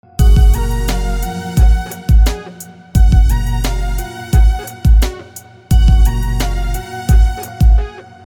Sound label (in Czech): melodii beat; k vasemu zpevu